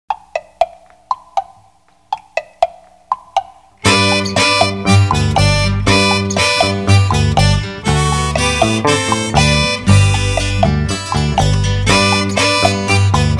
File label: Jumping Frog Instrumental